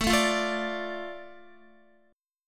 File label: A Suspended 4th Sharp 5th A Guitar; Asus4#5 strummed; JGuitar